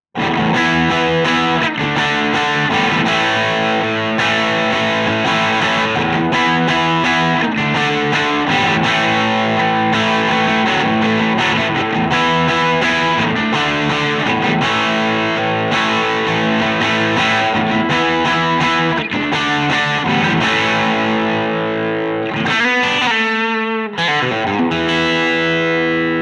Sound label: crunch; jensentone